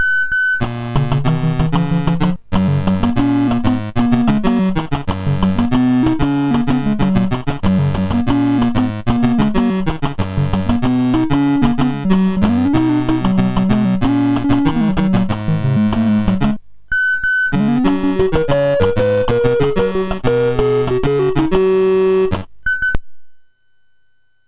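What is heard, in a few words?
MOS 6581 SID chip music, games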